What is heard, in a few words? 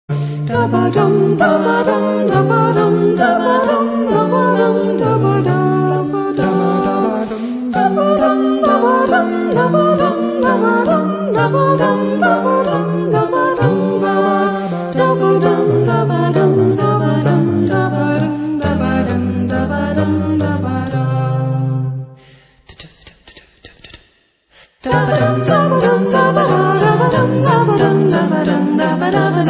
Vocal Quartet; Black Lamp